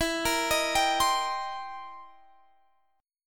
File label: C Minor 7th E; Cm7/E arpeggiated